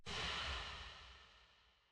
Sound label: Particle Reset; Main Character; Terrain Gen